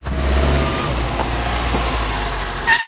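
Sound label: Brakes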